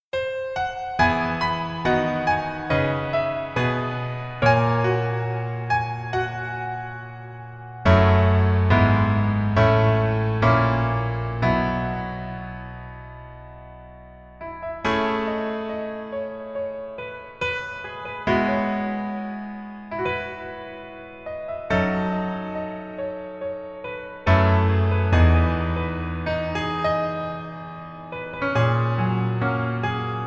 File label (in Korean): Piano ver 멜로디MR